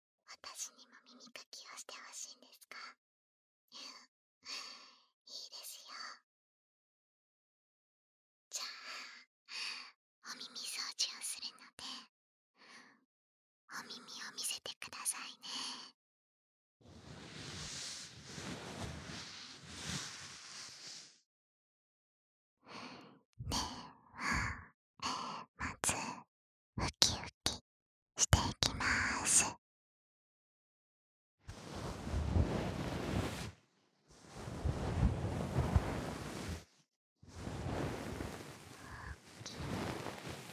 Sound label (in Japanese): 耳かき; みつばちゃんの耳かき; みみもと☆ぱるふぇ(ハイレゾ版)